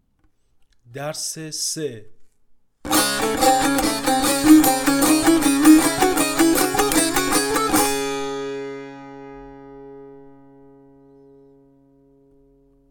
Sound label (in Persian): مشق تنبور; تنبور جلد دوم; هفتاد و دو مشق تنبور